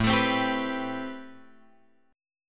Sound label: A-Minor 9th A; Am9 Chord {5 X 7 5 8 7} strummed